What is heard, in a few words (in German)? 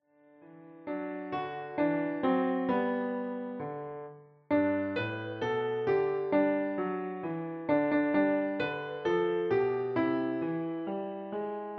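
Klavier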